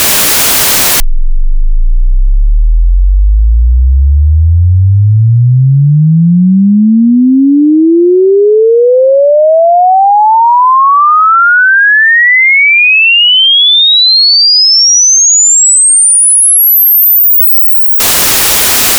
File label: log chirp